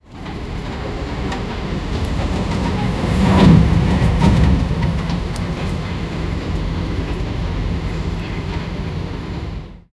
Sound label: sound of a train car, clattering